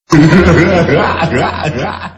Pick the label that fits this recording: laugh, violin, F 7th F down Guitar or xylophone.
laugh